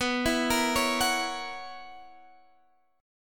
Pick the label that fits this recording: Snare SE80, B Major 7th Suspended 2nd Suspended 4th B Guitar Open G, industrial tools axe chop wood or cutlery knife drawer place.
B Major 7th Suspended 2nd Suspended 4th B Guitar Open G